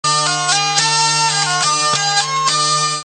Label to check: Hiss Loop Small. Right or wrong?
Wrong